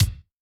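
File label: bass drum gate, Kick, Yamaha DTXpress-II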